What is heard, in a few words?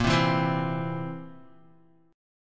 A sharp Suspended 2nd Flat 5th A sharp, Guitar; strummed; A#sus2b5; JGuitar